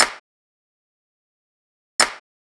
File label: Clap; Ableton Live 9 Essential Training